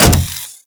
Riot Moa Step Default, Footsteps; Warframe

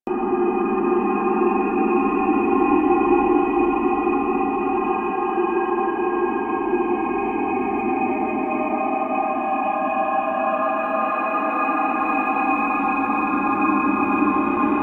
Space Exterior Amb; Warframe

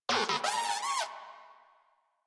Moa Compassionate Vocal Enemy Spotted, Pets; Warframe

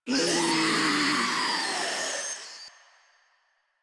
Infested Ancient Death; Warframe; Proto Infested

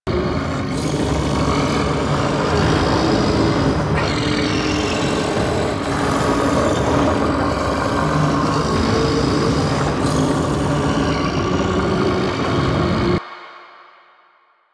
Struggle Prompt Loop; Warframe; Lotus Eaters; Quests

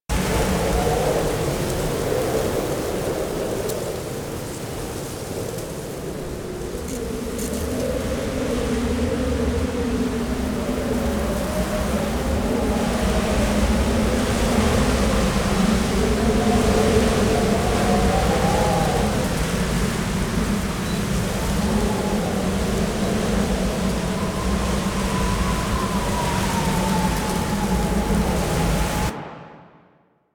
Exterior Foreground Field Angry Jealous Loop; Ambience; Duviri; Warframe

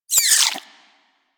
vocal pain, critters

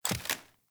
Steps; Arthur; Warframe; Ambience; Nineteen Ninety Nine

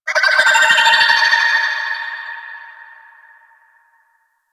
Mine Drone Underwater Vocal

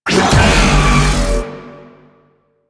Blast Cast; Warframe; Caliban

